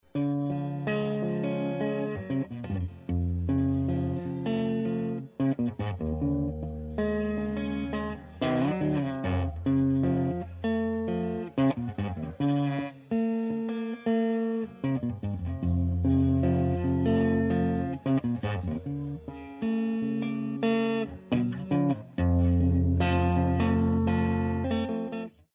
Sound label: Acoustic